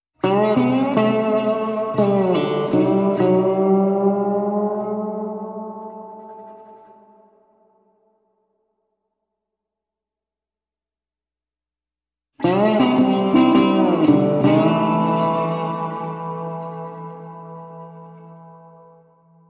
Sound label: Outlaws Bed Dustbowl Drift A minor Guitar Only